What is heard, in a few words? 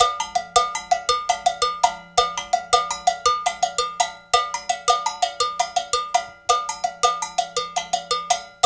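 110 bpm, 6/8; Spectrasonics; Supreme Beats; African Contemporary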